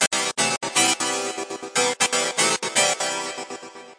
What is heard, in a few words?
gated harpsichord line; SYNTH AND ELECTRONIC LOOPS